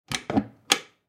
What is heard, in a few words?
Ship Bed Wood Cabinet Under Bed Open; Ship Bed Wood Cabinet Under Bed Open Slow; Transportation Watercraft; Door Slam Sound Effects; Blastwave